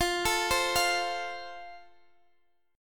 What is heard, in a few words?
F Major Guitar Drop D; x,x,15,14,13,13; down; chord shape